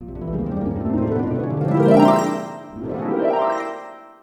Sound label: Miracle Jingle; Harfen Jingles für Wundervolle Sequenzen.